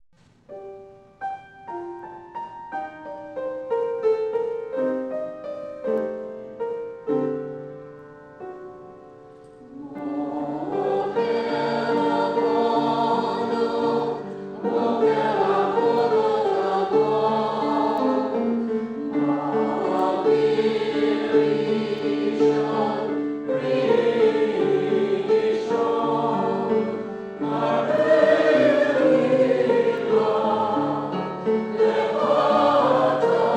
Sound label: Kel Melech Yoshev Choir